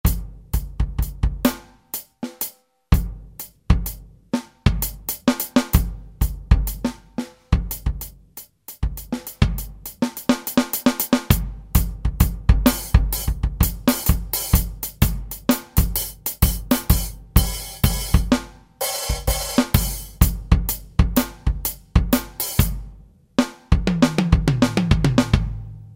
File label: Tiny Kit, Real Drums; Yamaha A4000; Kits